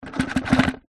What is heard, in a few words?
household furniture wooden drawer removed small shake items rattle; zapsplat